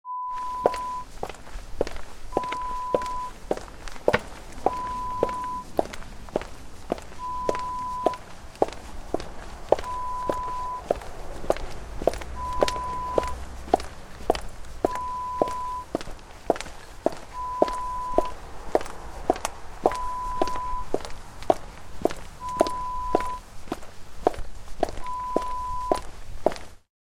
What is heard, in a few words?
Footsteps Pavement